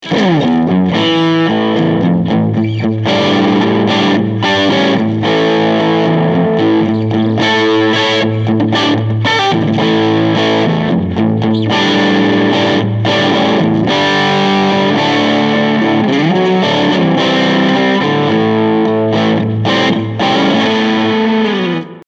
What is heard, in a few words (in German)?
AC 30 Hall